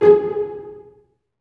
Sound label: STR SEC, STRINGS; Roland - LA Composer vol. 1